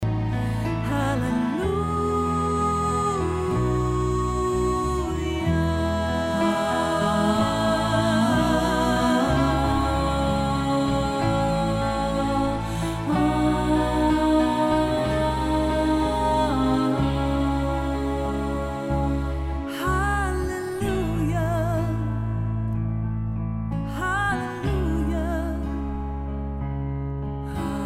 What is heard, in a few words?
Hallelujah Alto